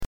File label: silence